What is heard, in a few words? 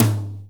TOM D, Drum Dry; East Collexion; Drum 1